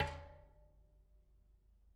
Bicycle Spoke

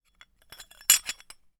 Hole Saw Rattle, Hole Saw Bits

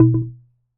Wooden Bar Bounce

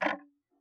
Tick, Clocks Kitchen Clock

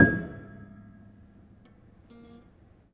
Harm High, Piano Harmonics

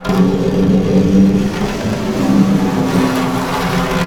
Snare Drum; Percussion Samples

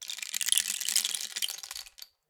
Rain Stick, Percussion Samples

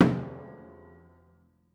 Body Thump Sgl, Piano Destruction

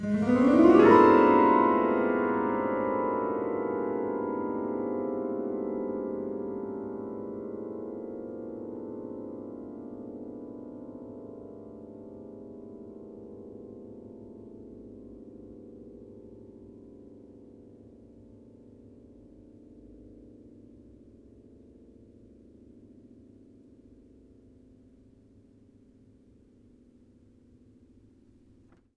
String Brush, piano; Piano Miscellaneous

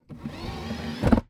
Eject, DVD Drive Internal

